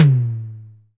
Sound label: ambient tom sound c sharp key